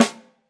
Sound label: phat acoustic snare A key, steel snare drum sound tuned to the A note